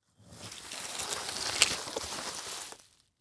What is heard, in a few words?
Foley Metal Drag Through Leaf Debris Slow